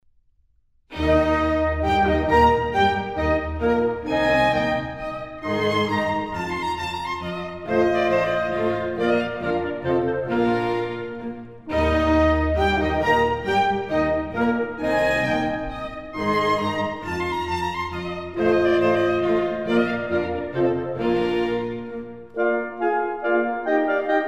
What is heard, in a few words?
Divertimento in E flat K113 Menuetto Trio; Mozart; Divertimenti; St Martin in the Field's Chamber Ensemble